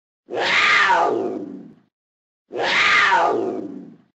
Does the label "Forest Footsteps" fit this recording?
No